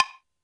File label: Lo Block, Alesis D4 drum machine